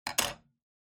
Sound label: Scissors Put Down